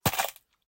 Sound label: Action Hit; SoundsCrate